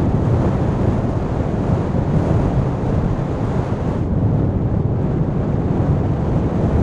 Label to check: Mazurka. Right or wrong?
Wrong